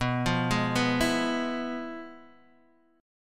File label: F Minor Major 7th Flat 5th, B